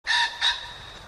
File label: pheasant cackle